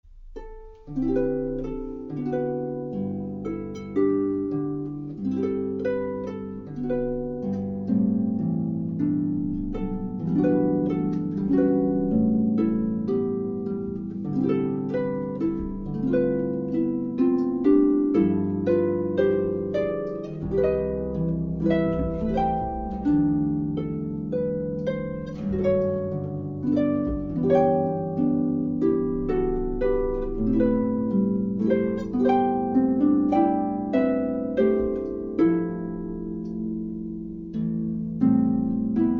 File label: string quartet; Gabriella Strings